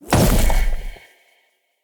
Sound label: Corruption Wave Spike Burst; Enemy; Lady Deora; Abilities